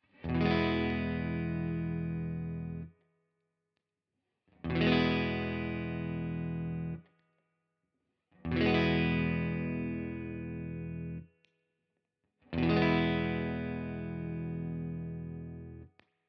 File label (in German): Carl Martin Tone Tweaker alle Regler auf 12 Uhr